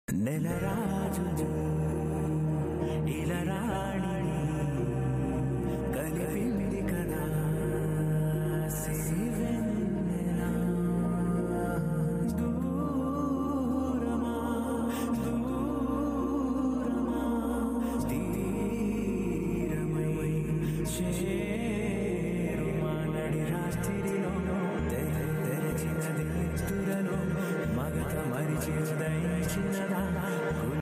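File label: love background music ringtone; shyam singha roy; nani; sai pallavi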